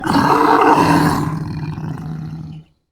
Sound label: Tiger Growl; Suspense scenes